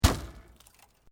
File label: Metal Light Movement Minor; Metal (Sound Effects:Materials)